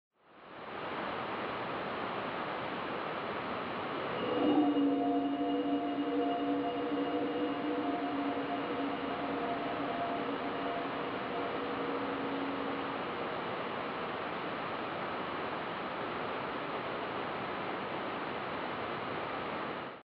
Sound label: tuning fork scene